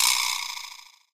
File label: Jingle Failed; Pokemon Smile